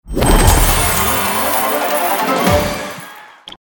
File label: win hi